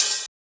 Open Hat

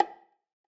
Perc; Percs

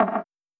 perc

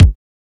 Kick

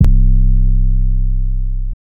808s

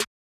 Open Snares

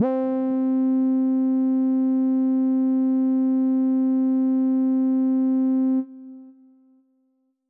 Lead, Synths; Brown

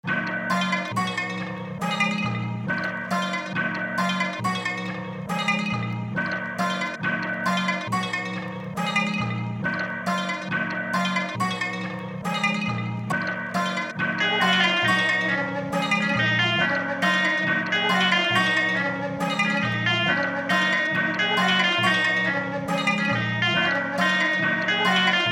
69bpm

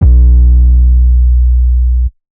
808's

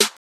Open Snares

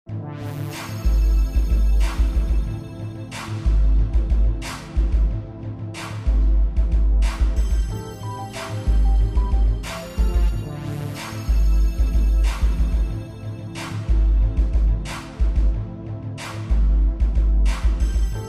Instrumental

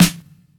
Snares

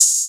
Open Hats

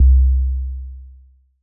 808 MID

